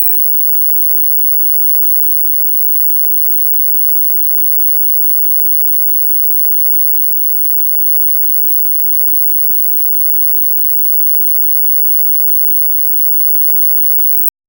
high frequency, electrical; create a high frequency h

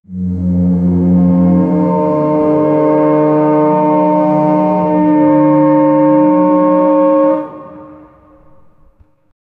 deep resounding horn like, reverberating

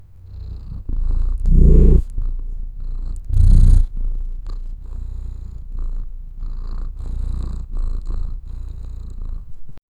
a tiny high pitched curio, cat

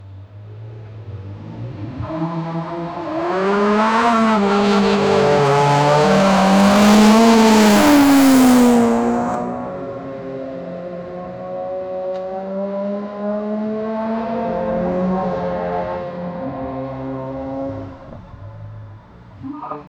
the powerful and crisp so, engine sounds

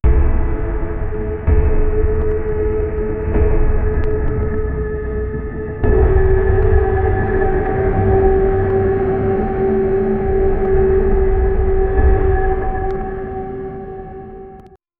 create an ambient for my, simulation

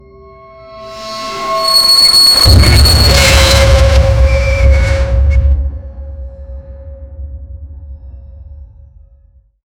metal

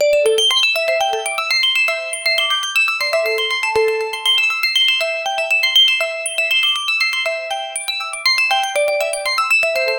clean bright ringtone

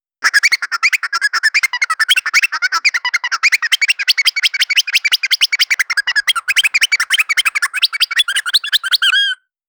cartoon style duck sound; avian

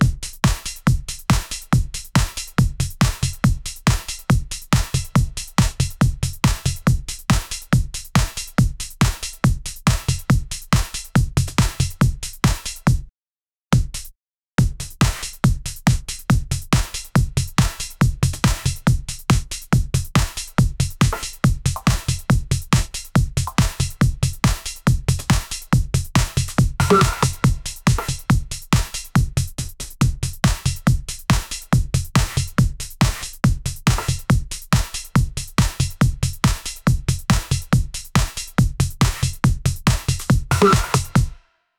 drums for song in disco

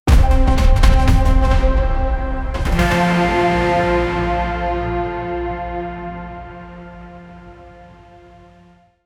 movie like cinematic mafi; mafia

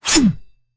fast sword slash sharp; action game

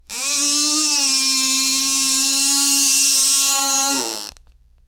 electric trimmer buzzing, haircut

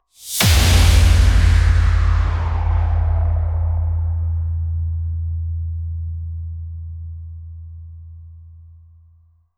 metal

